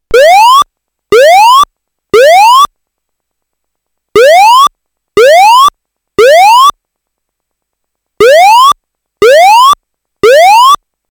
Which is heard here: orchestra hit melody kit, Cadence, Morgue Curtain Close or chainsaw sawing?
Cadence